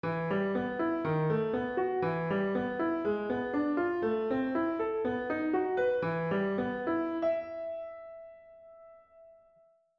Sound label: piano